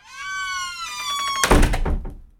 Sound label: close; activities farming; Cosmic Rage